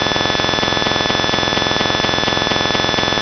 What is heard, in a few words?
gsm, scanner